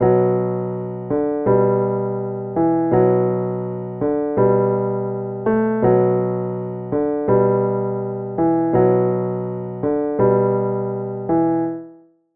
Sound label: Melody Loop - 165 BPM B Min, Hip Hop; Humble King Melody Loop; Cymatics - Humble Hip Hop Sample Pack